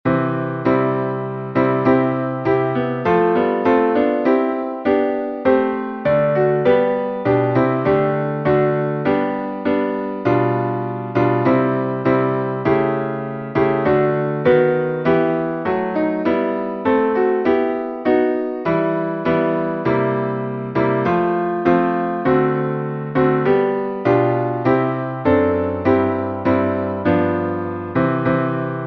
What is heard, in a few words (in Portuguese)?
salmo instrumental